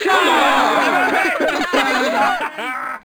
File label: foul, Crowd; Freestyle Street Soccer; XBOX